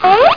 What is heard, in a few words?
whoop